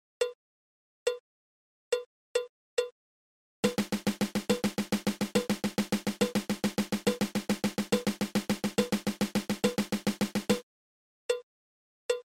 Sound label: Triple Stroke Roll